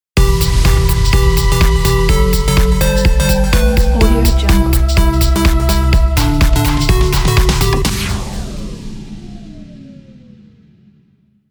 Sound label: fashion opener logo